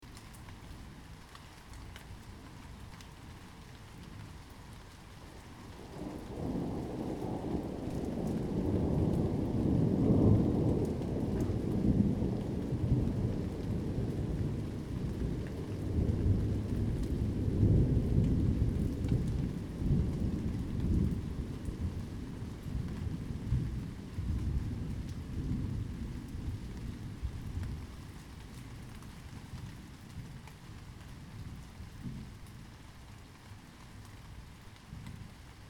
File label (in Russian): dojdj nachinaetsia; шумом дождя, падающих капель